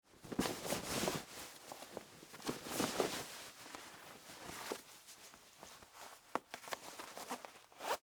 Jeans Put On, Foley, Clothes, Jeans, Put, On; Dressing; Human; BlastWave

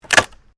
WOOD PLANK BREAK; Material components for constructing more complex sounds; Sound Effects:Materials

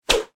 Whip Harsh Fast; Foley; Objects

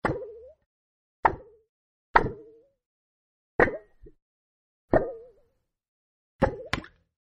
High Pitched Cartoony Effect-6 Takes; Cartoon Sound Design

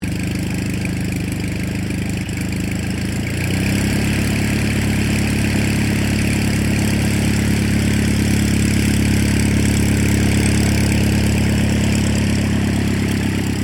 AIRCRAFT RYAN STM-S2 TAXI; 1939 Ryan STM; Airplane; Airplanes; Vehicles

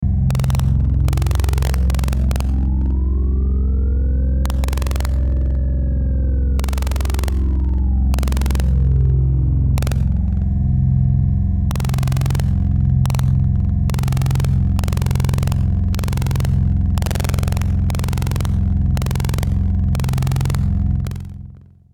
static flange; Static Flange Crackle Buzz; Production Element Imaging Element Accent Transition; Blastwave

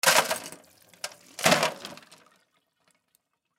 Sink Dump Out Ice Drain Water; Bathroom; Household; Blastwave

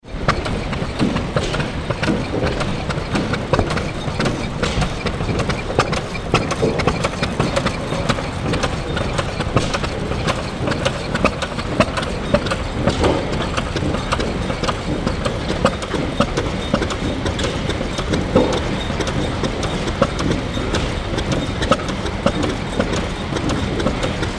Engines, Single Cylinder 4 Stroke Motors Running; 1911 Circa; Single Cylinder 4 Stroke Motors Running At Display Ground; Machines; Tom Hutchings

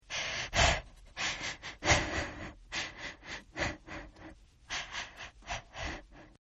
Breath Running Female; Breathing; Human; Partners In Rhyme

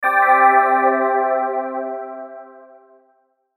Shimmer Bells Swell, High Tone; Blastwave

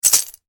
money piggy bank shake; Piggy Bank With Coins Inside Being Shaken; Objects

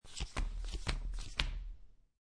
Playing Cards Dealing Three Cards Flop; Casinos; Lynne Music

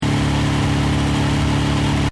Engine: High Revs Loop, car; Cars; Vehicles

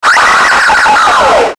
Distortion Squelch Delay Frequency Sweep; Blastwave

